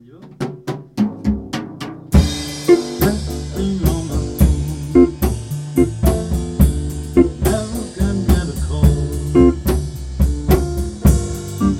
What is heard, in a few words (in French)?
manbo, plus de batterie